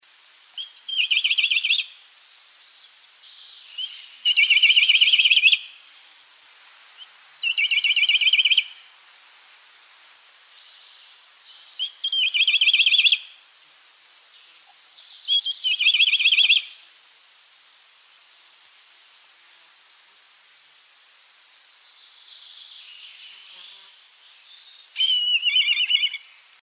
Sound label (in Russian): krik korshuna